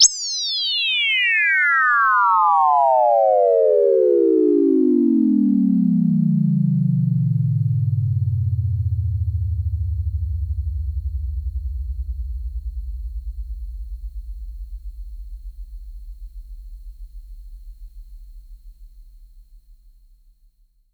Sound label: SYNTH FX